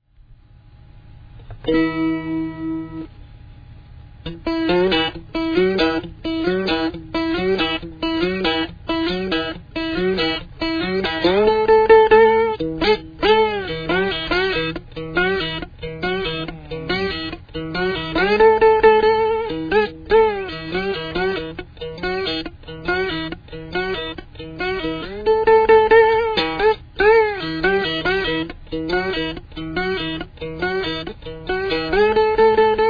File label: Ukulele (2 string); D.F. Duck